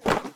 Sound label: box open